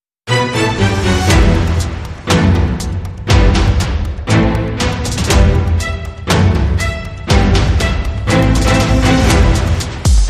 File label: Hybrid Sting